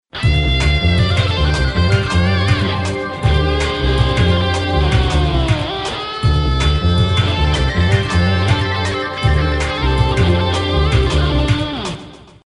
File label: Solo improvisation